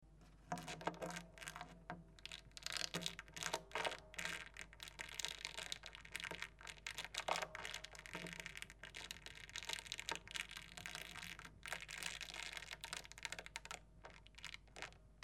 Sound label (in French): peigne, harpe